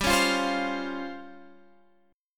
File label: C sharp Major 7th Flat 5th G